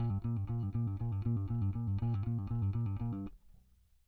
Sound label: bass